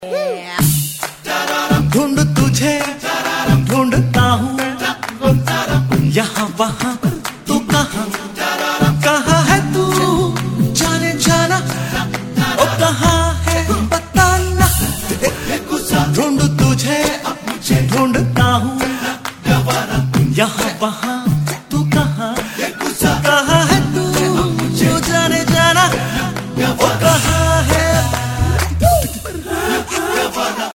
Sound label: ACapella